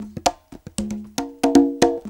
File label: CONGA 116, PERCUS LOOPS